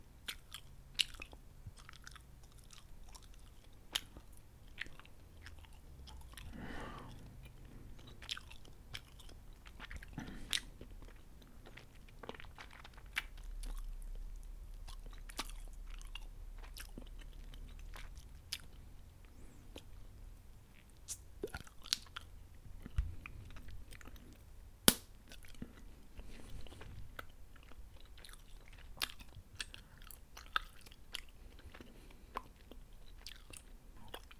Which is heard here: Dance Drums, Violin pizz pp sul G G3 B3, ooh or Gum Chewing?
Gum Chewing